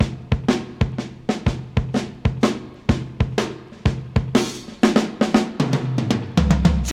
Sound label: 125 bpm high quality drum groove f key, Free breakbeat sample - kick tuned to the F note